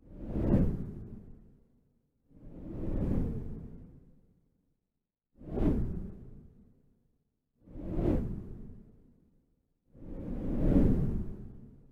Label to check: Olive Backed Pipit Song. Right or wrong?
Wrong